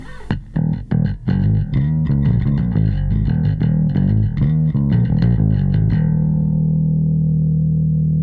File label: bass; Brutal